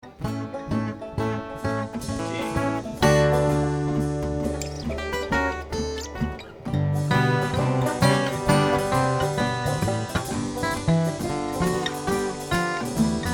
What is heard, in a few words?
Interlude